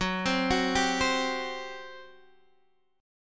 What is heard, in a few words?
G Major 7th Suspended 4th, F sharp